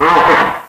ministrider hunter found enemy

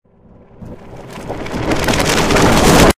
bumper car floor break reverse; ambient; Source Engine; tf

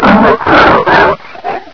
ag pain; agrunt; video games; source engine; bshift

